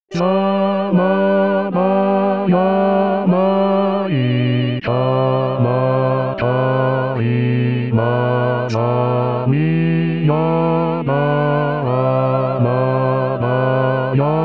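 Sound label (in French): basse voix accompagnement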